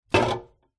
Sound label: household drink soda can set down inside fridge; zapsplat